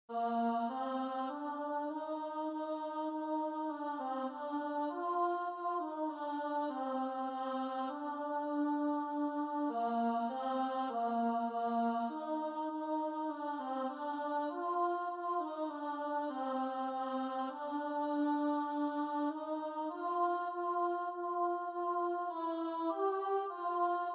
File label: Alto